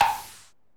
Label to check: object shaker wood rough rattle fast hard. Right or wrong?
Wrong